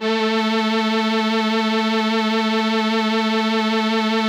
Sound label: SYN, ENSEMBLE